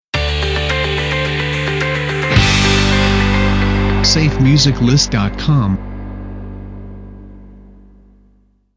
Positive Message Stinger; SafeMusicList

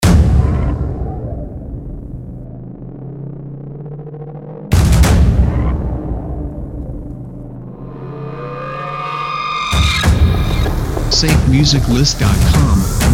Dark Horror Trailer Intro; SafeMusicList

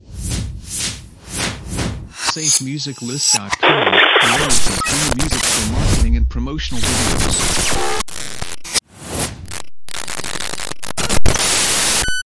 Swoosh And Glitch Video Transitions

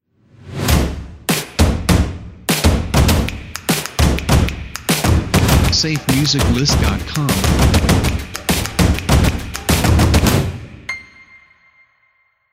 Dynamic Energy Beat Intro; SafeMusicList

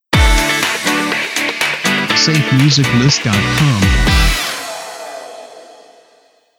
Stinger

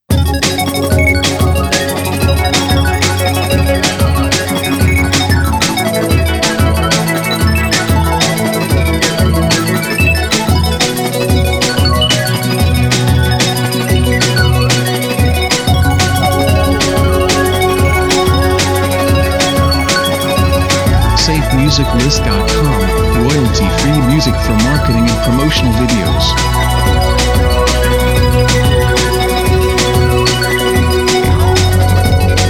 Technology Revolution

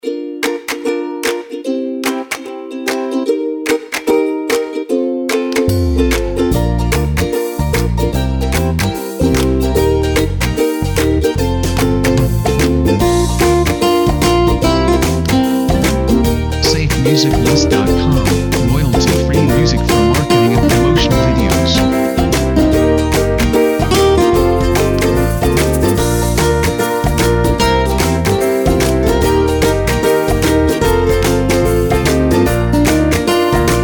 Pure Happiness

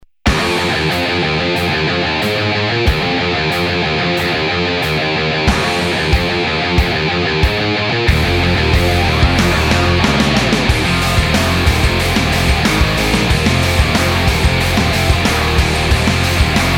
Adrenaline Power; SafeMusicList

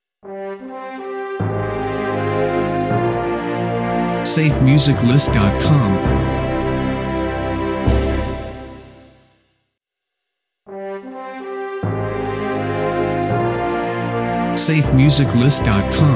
Cinematic Orchestra Video Intro; SafeMusicList

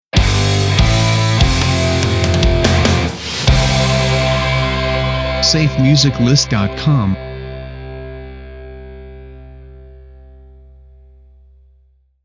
Secret Of Love Stinger

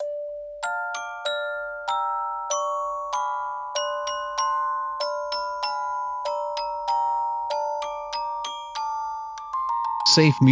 Sugarplum Fairy Christmas Dance

